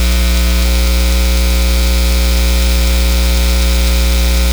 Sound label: Midi Click Using Roland USB Midi Input; RME